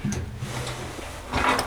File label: Vehicle reloads; Red Orchestra: Ostfront 41-45